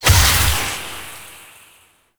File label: Projectile Death Medium, Weapons; Warframe; Duviri; Incarnon Adapters